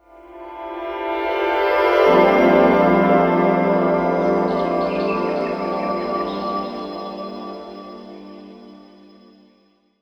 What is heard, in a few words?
Reed Hit - 7A, Jungle; Blu Mar Ten - Jungle Jungle - 1989 to 1999 Samplepack